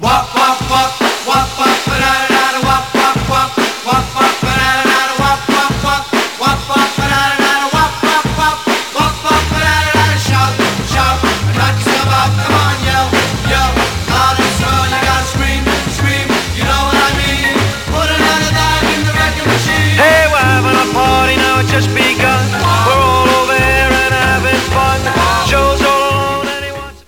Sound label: shout shout